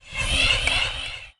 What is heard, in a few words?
haunt